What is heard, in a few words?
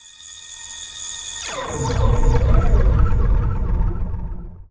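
SYN right, Production Effects; Best Service - XXL Production Effects; SYN-FX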